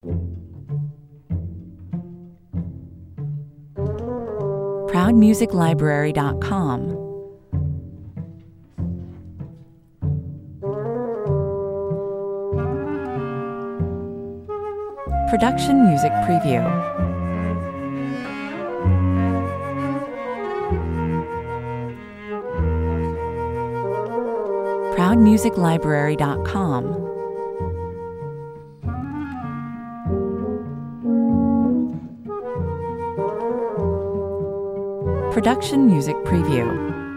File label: This sneaky and lightly comedic soundtrack has sudden mood changes shifting back and forth, 96 bpm; This sneaky and lightly comedic soundtrack has sudden mood changes shifting back and forth from mysterious suspense to playful curiosity